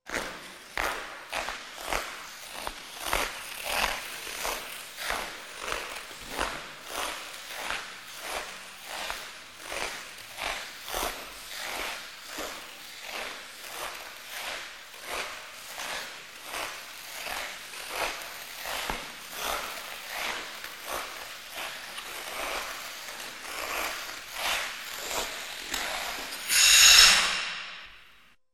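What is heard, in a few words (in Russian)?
бег и остановка конькобежца; Звуки катания на коньках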